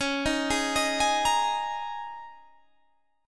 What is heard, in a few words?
Ab Suspended 2nd Suspended 4th Db